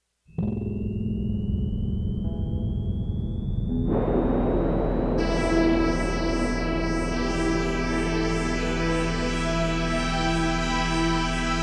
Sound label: Instrumental